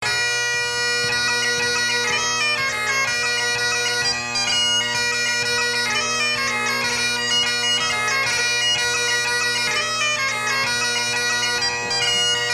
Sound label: Jigs, bagpipes